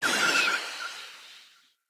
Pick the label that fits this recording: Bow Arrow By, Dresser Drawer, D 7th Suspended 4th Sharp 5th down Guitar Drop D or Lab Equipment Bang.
Bow Arrow By